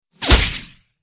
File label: Bullet Whiz Wet Body I